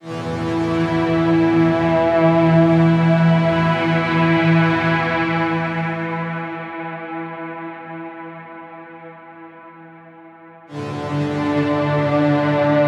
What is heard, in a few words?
Synth Pad - Em 90BPM; STEMS; Ghosthack; SkyRealm; Mood Kits; Mood Kit 05 - Hands Of Time